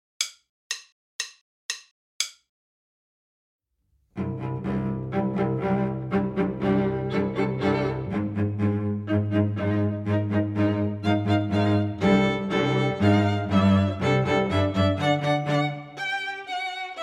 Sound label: minus Violin II